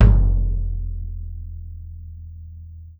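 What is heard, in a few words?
bass drum open; E-MU Producer Series Vol. 7 – Old World Instruments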